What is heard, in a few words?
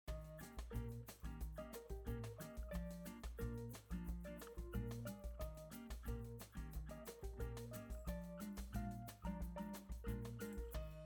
music